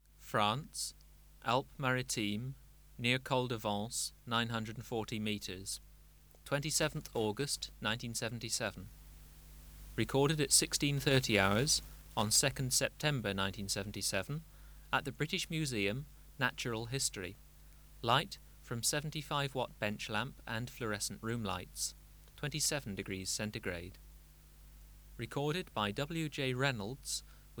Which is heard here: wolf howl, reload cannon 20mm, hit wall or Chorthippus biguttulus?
Chorthippus biguttulus